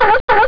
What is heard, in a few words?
seal